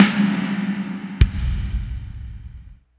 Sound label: snare kick, Hardcore Breakbeat; Big Fish Audio; Hardcore Breakbeat Action; Robot Live